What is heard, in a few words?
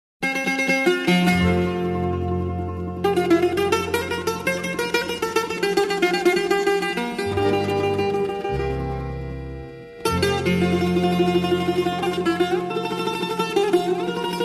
bouzouki, Greece